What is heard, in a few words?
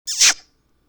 kiss beso sound effect